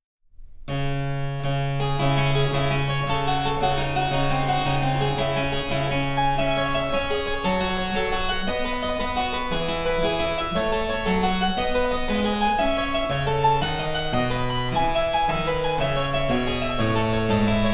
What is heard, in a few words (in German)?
Cembalo; Mark Lindley WTC 1